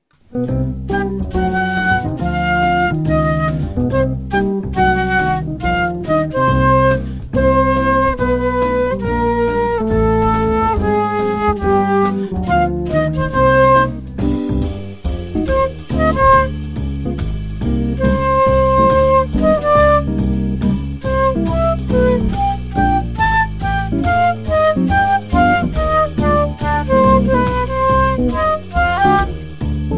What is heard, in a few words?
jazz